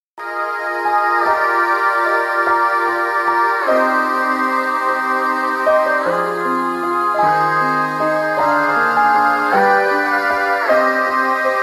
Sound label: Lost Piano; miku ver